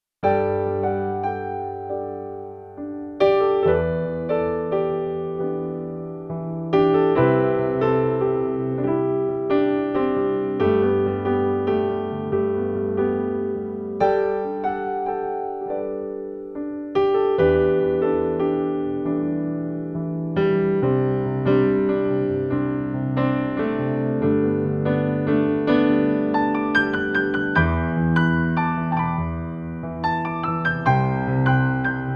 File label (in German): royal grand ohne fx; Nord Grand